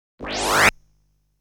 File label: appearance sound; legend of hero tonma; Claydon (enemy)